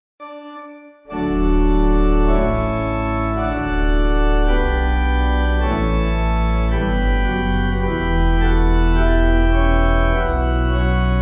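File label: Sanctus, pipe organ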